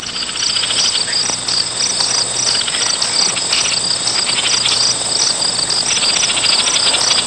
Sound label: Bird chirping cricket ambience sound